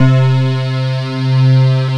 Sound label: Synths Note C; Best Service; House Definition VOL-1